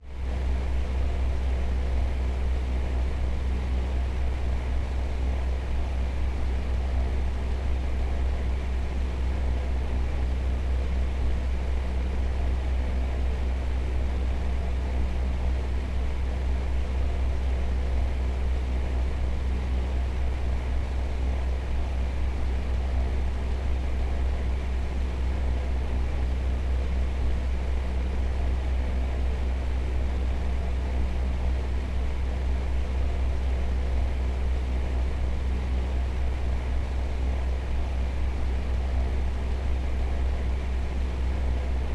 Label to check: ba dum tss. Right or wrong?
Wrong